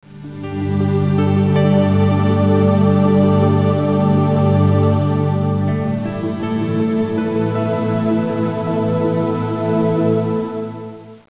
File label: music